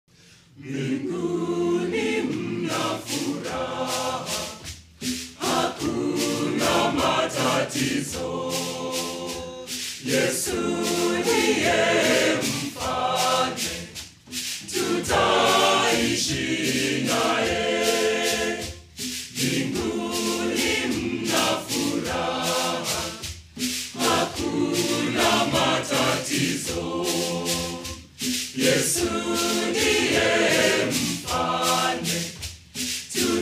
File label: Friends Church Choir; Quaker Music